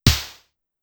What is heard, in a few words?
Snare, Pitched Down; Clavia DDrum-AT